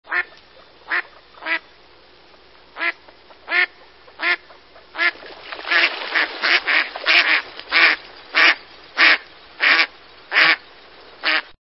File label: American Black Duck